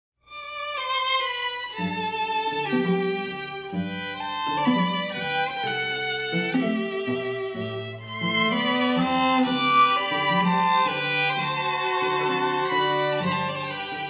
O Sole Mio, string quartet

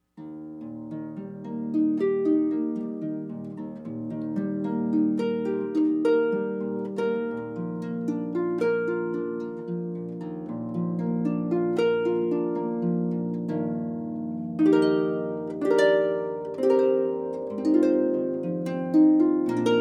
Harp

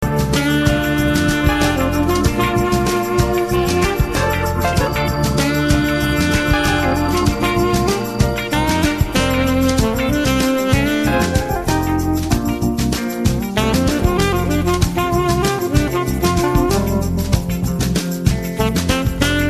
Saxophone

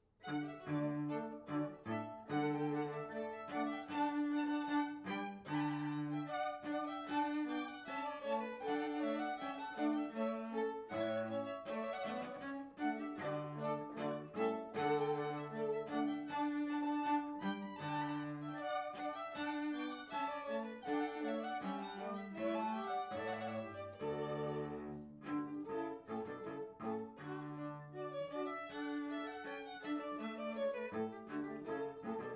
string quartet

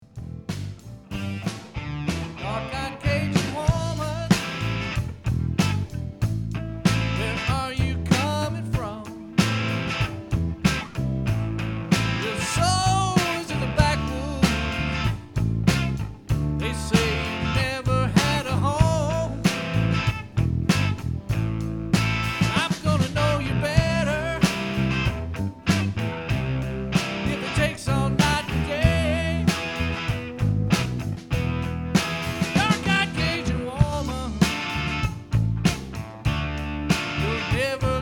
Classic Rock Band

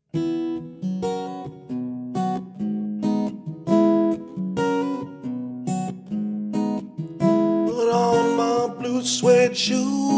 acoustic guitar

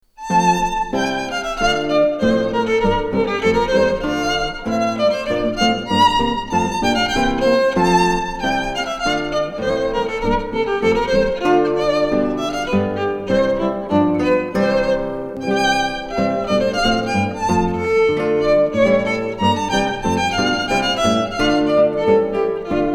hornpipe guitar violin

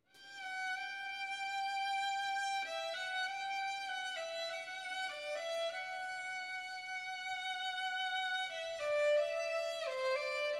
vocalise, violin; l rothe; Leah Diane

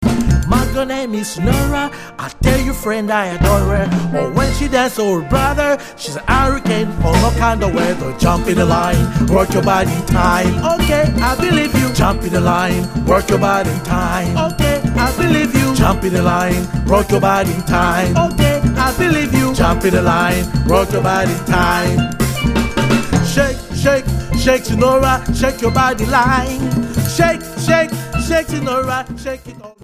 Calypso

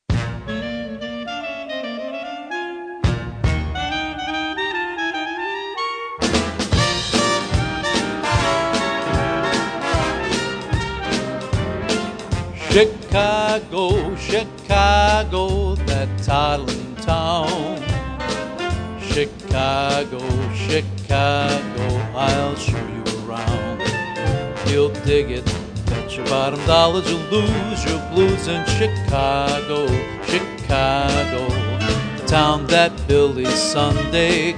Chicago, Swing

